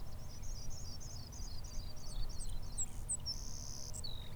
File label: Spragues Pipit Savannah Sparrow